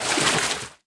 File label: Hoverboard Loop Water Splash Out; Subway Surfers City; Android, iOS